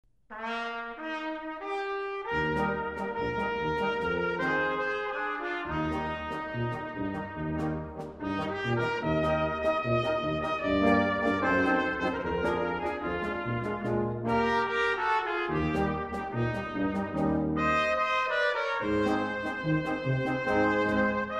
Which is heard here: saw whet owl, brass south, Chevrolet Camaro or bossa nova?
brass south